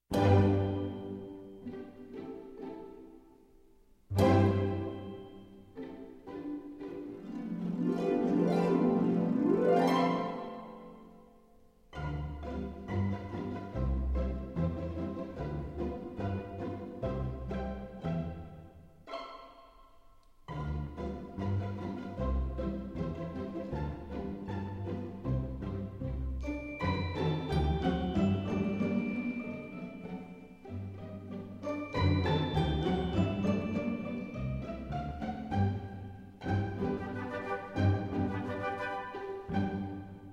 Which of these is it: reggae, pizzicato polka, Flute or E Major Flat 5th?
pizzicato polka